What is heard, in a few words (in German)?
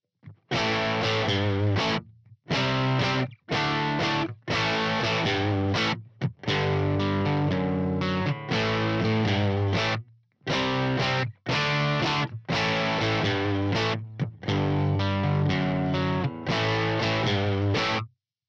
gitarrensound fetter; Layering